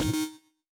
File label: Support Failure